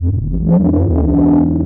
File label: Big Bumble; martyn stonehouse